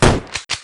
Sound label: mortar shot; road-rage-tank; sfx